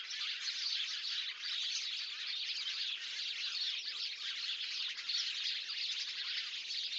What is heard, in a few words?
Sparrows